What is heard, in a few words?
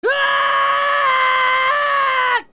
Adult Scream; ZeldaSounds; OOT